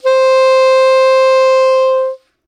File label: saxophone soprano C octave 1